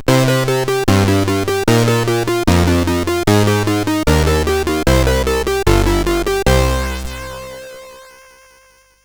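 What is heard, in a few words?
Lights On, MOS CSG8580R5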